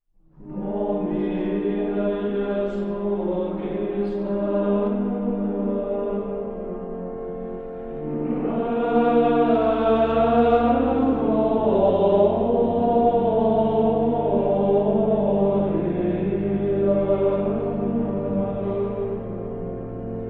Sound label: requiem offertory, chant